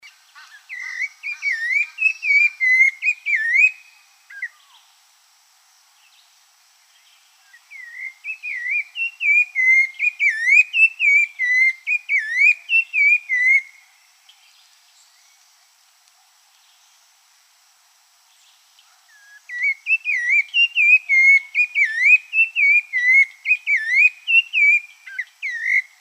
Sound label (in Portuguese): Sabiá-laranjeira